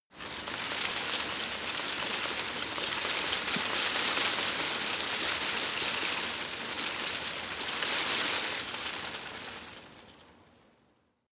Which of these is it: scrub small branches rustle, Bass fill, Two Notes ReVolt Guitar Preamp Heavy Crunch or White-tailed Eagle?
scrub small branches rustle